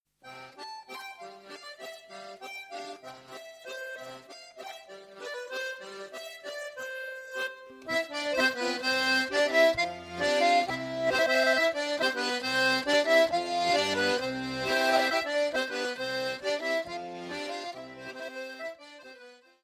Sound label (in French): Cotillon - France